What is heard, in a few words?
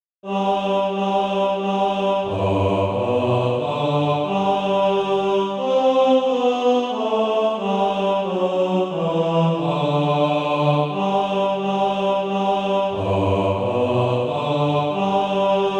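bass, SATB Practise Music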